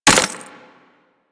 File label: Melee Impact Glass; Warframe; Weapons